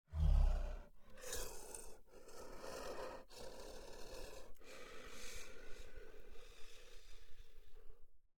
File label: MONSTER Yeti breathe